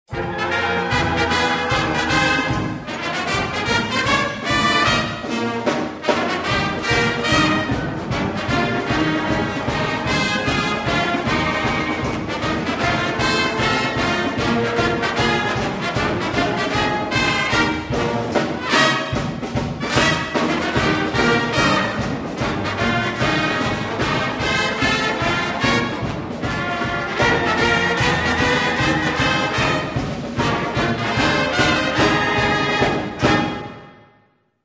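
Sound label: Fight Song, Pep Band; Buffalo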